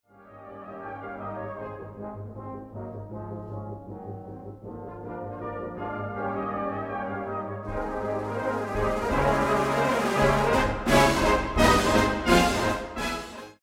Sound out the Proclamation

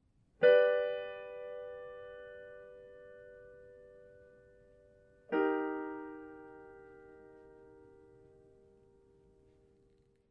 Mediant mod Down a Major third

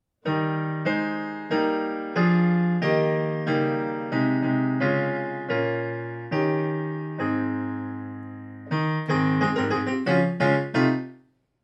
Sudden shift to a faster tempo

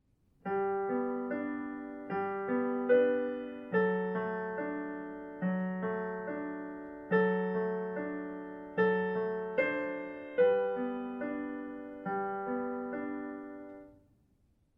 Play by ear in G

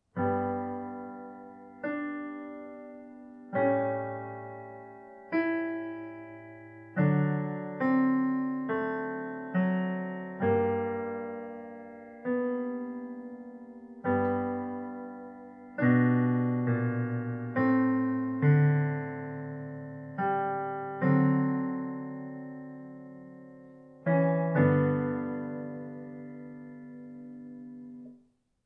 Simplified keyboard support

